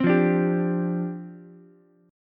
D Minor 11th D down Ukulele C6; Dm11 strummed; JGuitar

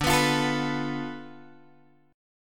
D Minor 6th Guitar Open G

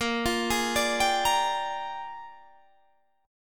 A sharp 13th, Guitar Standard; arpeggiated; JGuitar

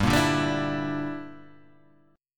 Bb 6th Flat 5th G

